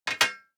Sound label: DICE on Metal, Drop, Standard, 3 Three Dice; Samples; ArticulatedSounds; DICE Sfx Party (Extended)